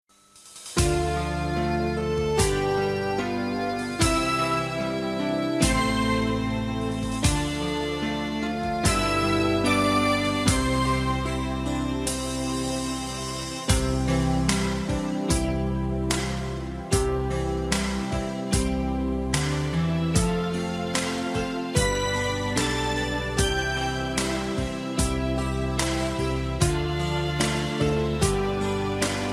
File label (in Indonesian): Karaoke